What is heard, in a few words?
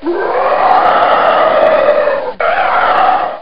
Skeleton Warrior